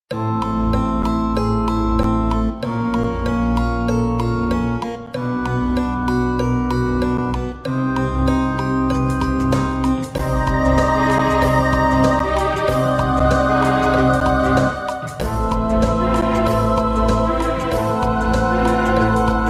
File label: Halloween Game Music 30 Second Countdown; Kahoot